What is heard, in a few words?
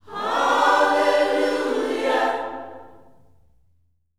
HALLELU, Vocal Planet, Gospel; HALLELUJAH